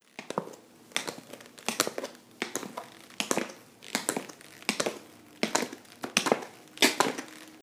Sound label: walking with taps